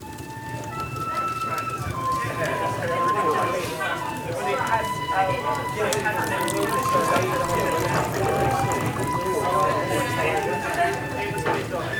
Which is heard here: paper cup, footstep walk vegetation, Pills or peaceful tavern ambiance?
peaceful tavern ambiance